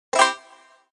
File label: Collect Honey; Banjo-Tooie